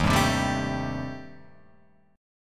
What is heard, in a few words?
Eb 6th Flat 5th Eb, Guitar Open F; Eb6b5 strummed; 3,2,0,2,0,2; JGuitar